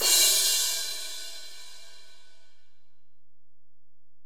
Ride, Alesis DM-PRO, Drum Machines

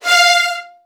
F2, VN 16TH, Virtuoso Strings; ILIO; Kirk Hunter

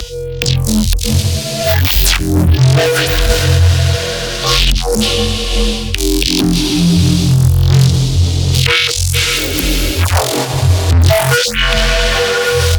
scream

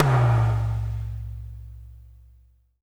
LO-FI KITS; Spectrasonics; Burning Grooves CD2

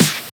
Snares; Vengeance - Essential Clubsounds Vol.4

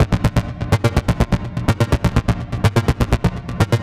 retro dirt Bb 125, synth loop; Zero-G; Soundclash; Nu Dance Electro

